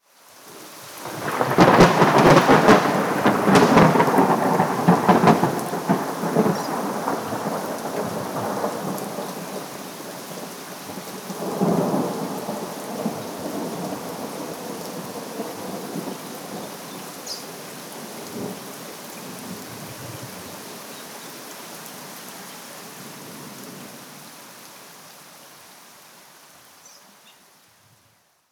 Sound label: nature ambience with lightning strike and thunder